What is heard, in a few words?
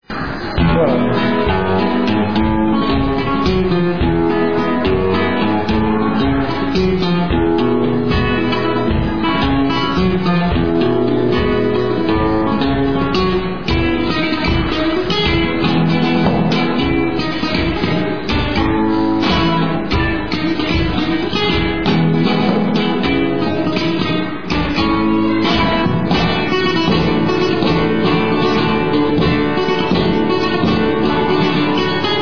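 Guajira Tempo, flamenco guitar; SEVILLA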